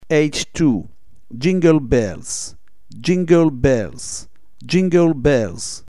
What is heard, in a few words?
jingle bells